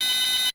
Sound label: clock; Hunter The Reckoning Redeemer; XBOX